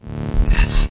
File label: drill through hit